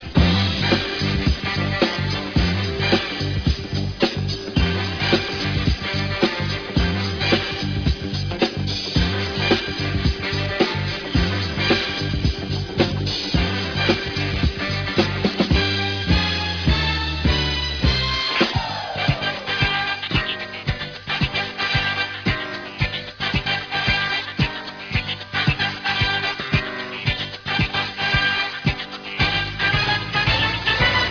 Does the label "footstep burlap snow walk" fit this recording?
No